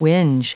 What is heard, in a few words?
whinge